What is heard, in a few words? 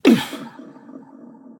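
non verbal ouch, voice; cernunnos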